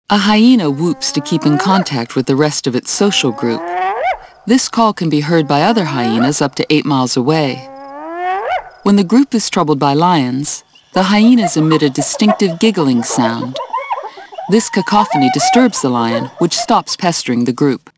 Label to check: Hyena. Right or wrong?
Right